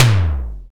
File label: SDS5 TOM D5; Greytsounds VOL-1; SDX TOMS